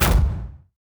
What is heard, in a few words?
ui rewards unlock appear stomp